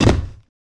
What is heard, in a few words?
footsteps land glass; player